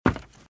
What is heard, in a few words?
Footsteps - Wood - Creaky - Run - Right; RadwinIsland